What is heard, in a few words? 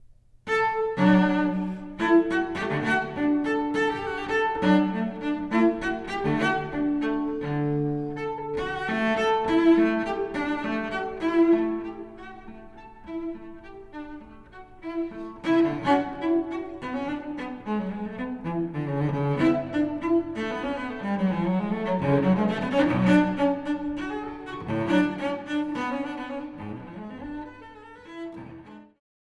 BWV1012 Suite No.6 in D Major Gigue